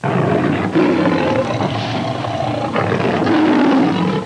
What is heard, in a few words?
mountain lion